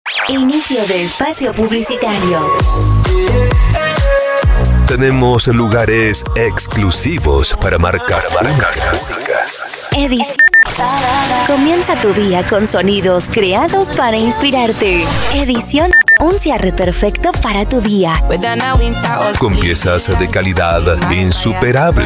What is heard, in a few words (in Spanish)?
Arte Ambient MIX Otono